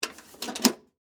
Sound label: Tool Box Latch, Industry, Tool, Box, Latch, Open, Metal, Case, Antique; Hand Tool; BlastWave